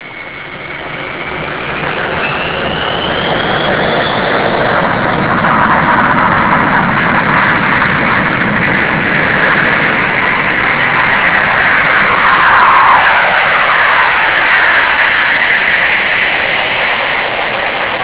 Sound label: concorde